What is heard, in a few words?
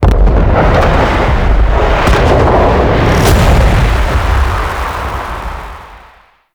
Void Dragon Death Explosion; Enemies; Gameplay; Warframe; Duviri